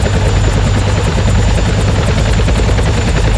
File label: helicopter loop